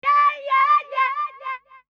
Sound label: VOICE GRL, Voice; Dance eJay 3 + Sound Collection 3